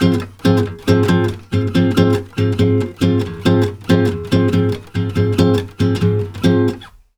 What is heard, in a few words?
BOSSA G#; USB Soundscan; AKAI; Brazil Bossa